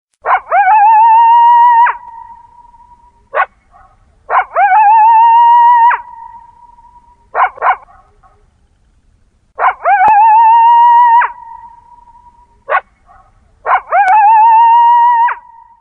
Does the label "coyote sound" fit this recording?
Yes